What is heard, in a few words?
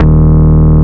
Root D#, Bass